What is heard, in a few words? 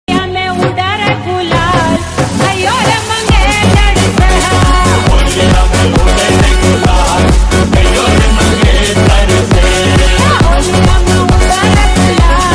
Holi Ringtone